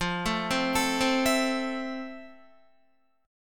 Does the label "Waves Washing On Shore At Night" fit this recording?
No